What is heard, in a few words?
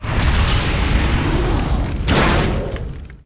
Fire Arrow Cast